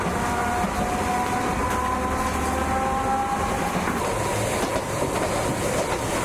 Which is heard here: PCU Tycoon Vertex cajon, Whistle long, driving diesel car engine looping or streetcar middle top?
streetcar middle top